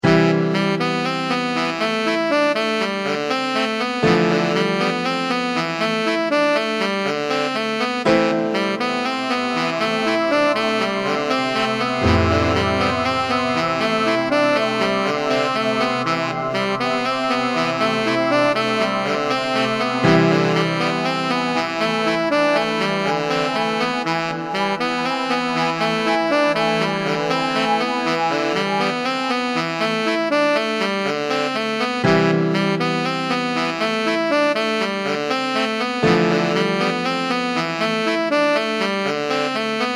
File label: music